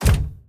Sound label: train door close; factorio 2.0.55